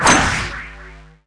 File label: SFX Swing Tomahawk; Pangya; Fantasy Golf